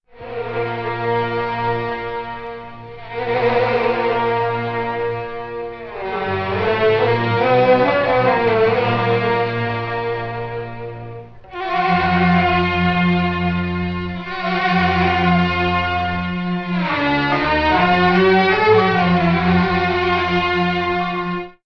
strings shivering in fear, Sad Loops

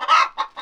chicken; Animals

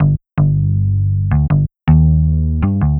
bass fuzz feels

